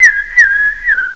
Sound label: BIRD; CMI Sound Library Rev 1.3